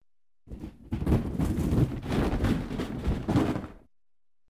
grohot korobka padaet vniz po lestnice

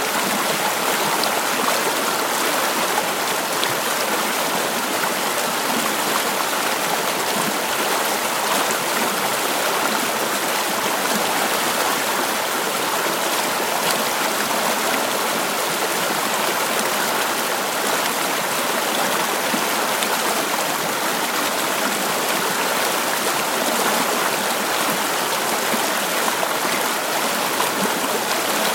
sredi gornih vodopadov i bushuyushih morskih voln

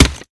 zvuk raskritiya skladnoj lopati

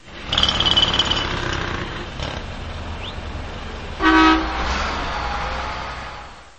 stroitelstvo blizkii signal

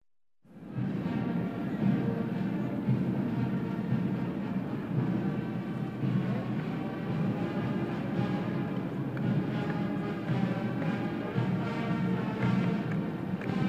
parad duhovoj orkestr i barabanshiki prohod

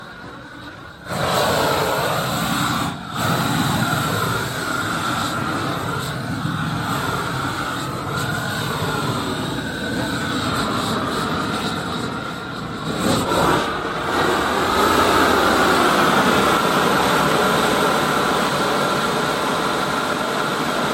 zvuk ognemeta kotorij plavit asfalt

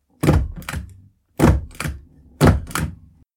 zvuk sshivaniya bumagi trehkratnij

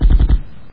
zvuk pulemeta gde to vdaleke

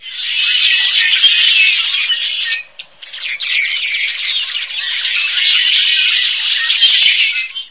obezumevshij krik celoj stai chernih drozdov

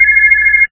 pishashij zvonok na mobilnik

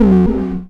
knopka cifrovoi shumnii blizkii dolgii

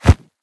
korotkij nesilnij vzmah nozhom

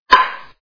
otbivanie myacha

